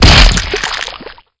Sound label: body splat